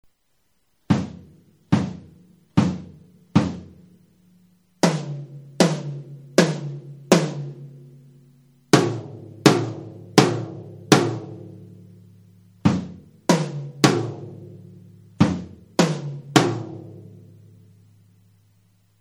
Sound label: ludwig; GIG-LITE SET